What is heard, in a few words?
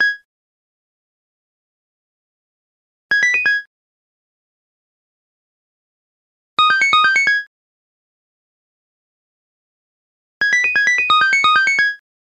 Crescendo; LG